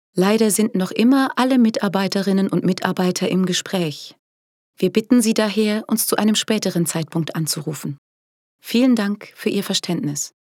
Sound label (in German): telefonpaket warteschleife